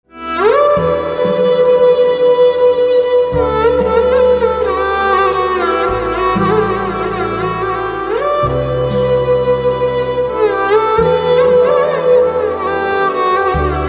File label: Violin